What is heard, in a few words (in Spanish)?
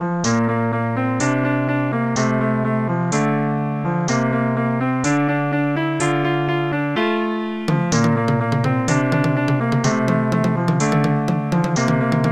musica final; chaneques